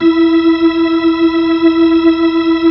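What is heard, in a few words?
B-3, Hammond B-3 organ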